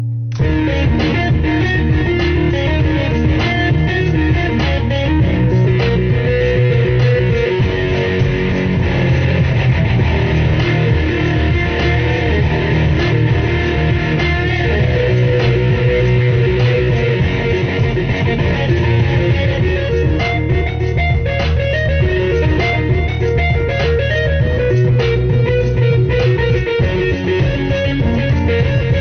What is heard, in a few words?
Gitar